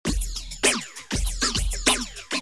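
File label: drums, Vermona, phaser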